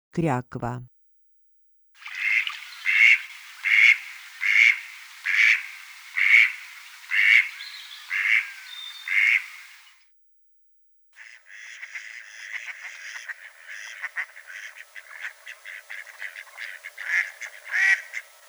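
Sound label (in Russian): kryakva golos, mallard voice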